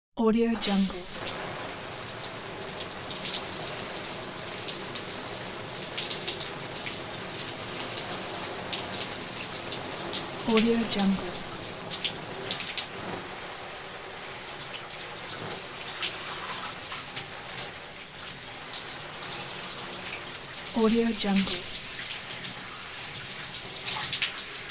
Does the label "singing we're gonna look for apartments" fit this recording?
No